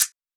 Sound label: hat; Anthemic